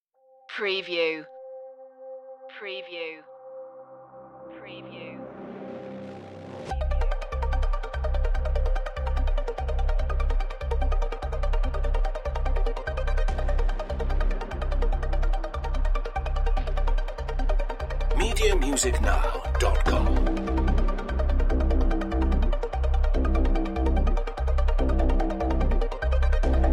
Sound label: magnetic turbulence no drums or sound fx